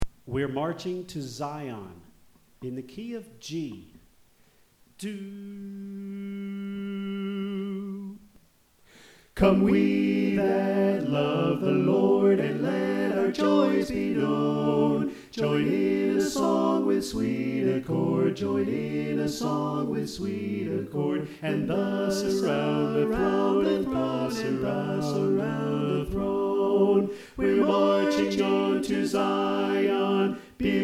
Soprano Left

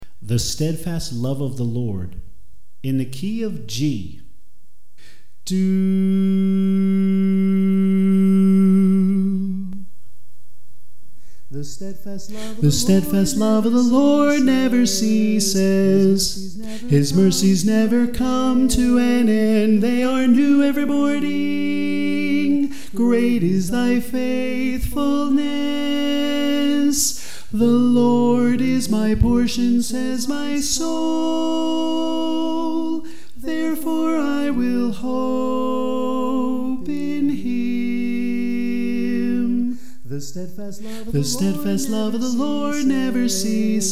Soprano

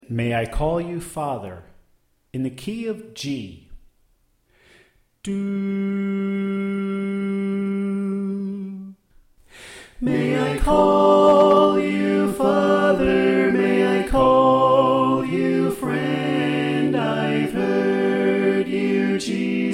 Tenor Left

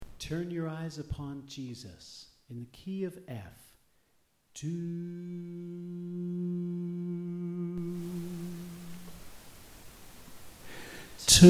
Bass

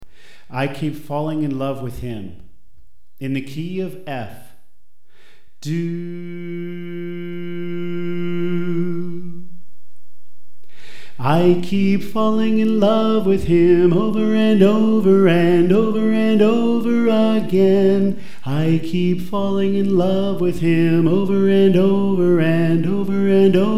Soprano

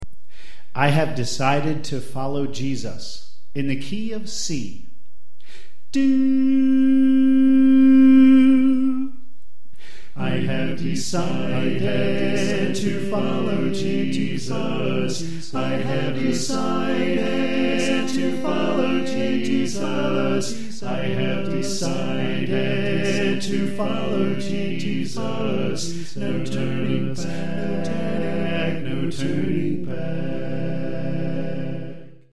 Alto Left; I Have Decided To Follow Jesus

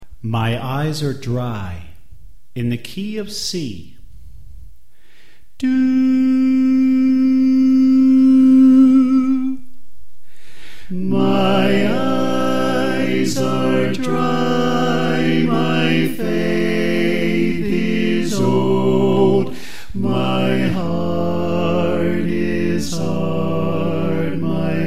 Bass Left

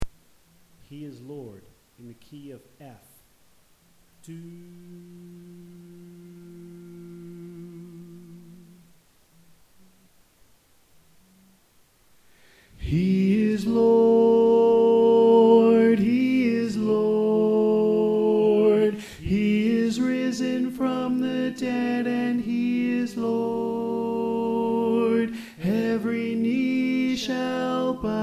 Tenor

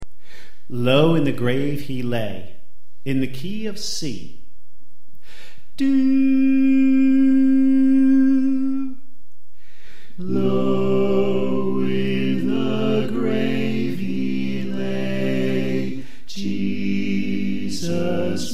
Soprano Left

